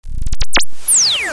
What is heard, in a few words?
Space Blast Wave